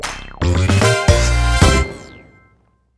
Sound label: medium win; netent